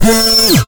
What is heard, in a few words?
layers drill synth; sinematic neon source; soundmorph